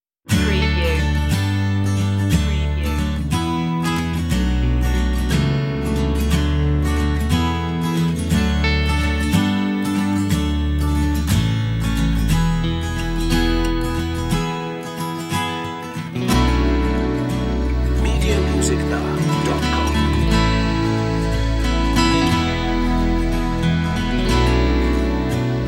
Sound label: seaworthy no drums